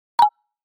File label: Message Sent